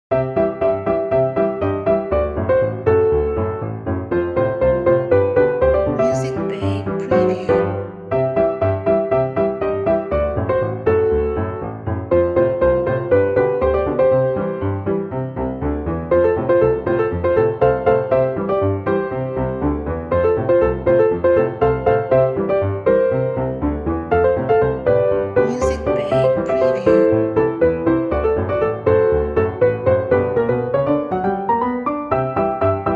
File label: Fun Ragtime Piano